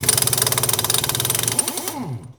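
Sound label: Impact small, air tools, industrial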